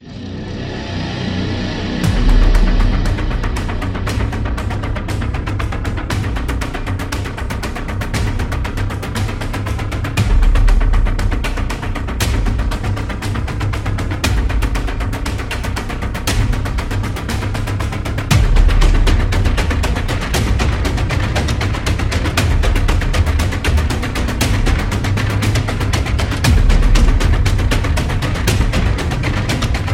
Scary, mystery

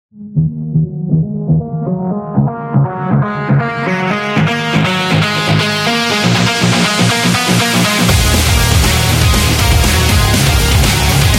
Destruction

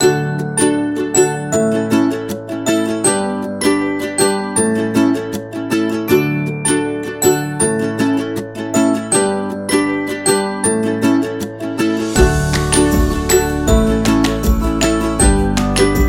Cooking Happy, ukulele

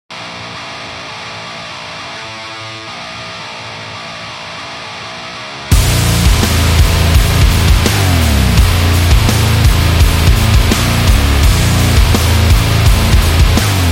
Aggressive Rock

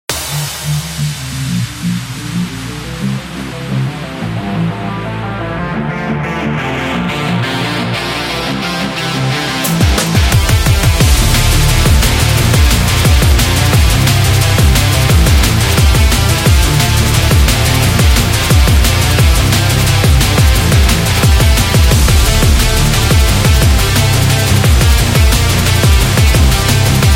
Action Sport

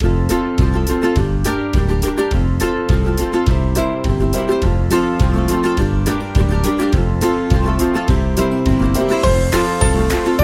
Happy Children; happy kids

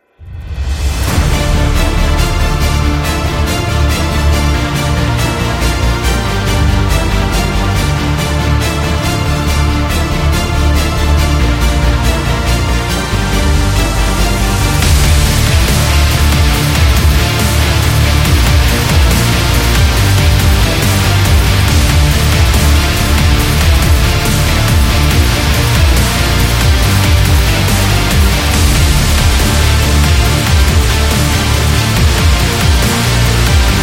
Sport Epic Metal; sports training energy

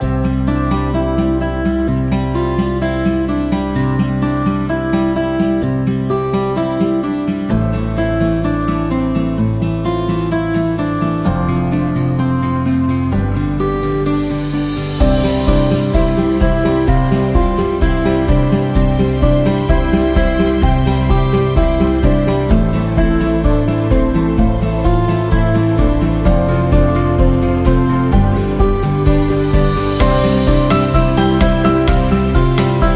Love Acoustic

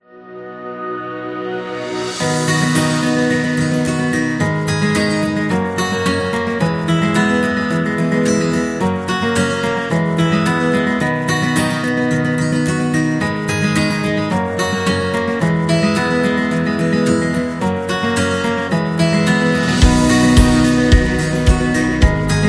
Guitar Positive

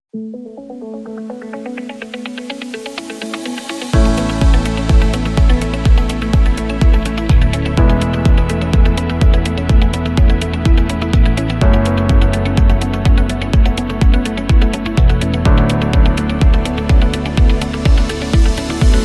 Professional

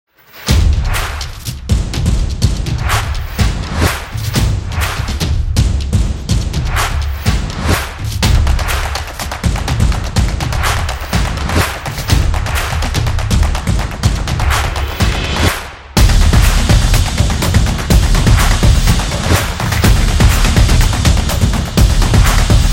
Upbeat Drums

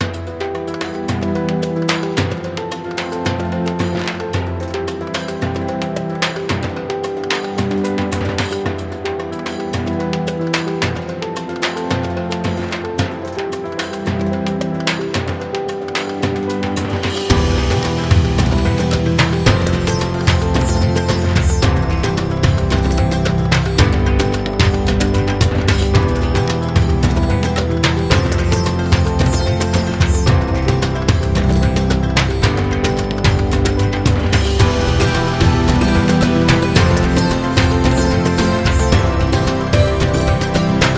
Traditional Indian

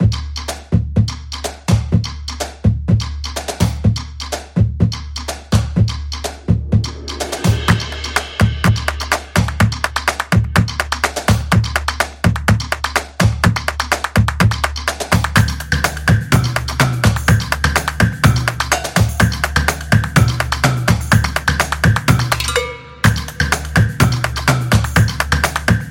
Rhythm; high energy

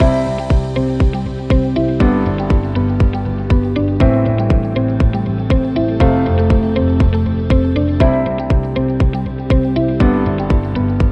Corporate Motivation Loop

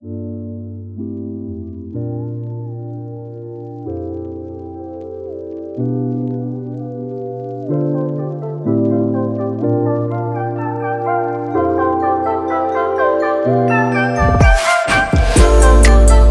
Winter Chill Trap

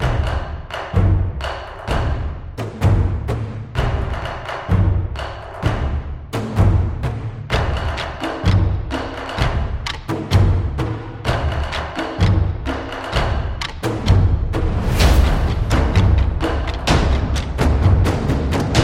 Taiko Japan

